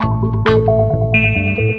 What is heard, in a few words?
133 beep loop; MELODIC LOOPS